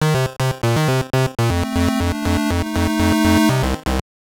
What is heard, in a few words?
Jingle; The Amazing Spider-Man; GB